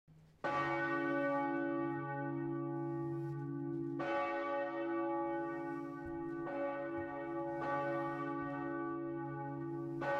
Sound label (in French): cloche vierge; reb3; 1975; cathédrale de Strasbourg